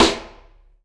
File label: Piccolo E, drum